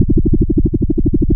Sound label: LFO, Noise